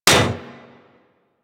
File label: Bullet Impact Generic Enemy Shield; Surface